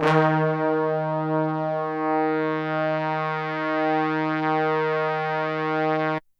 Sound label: HORNS D#2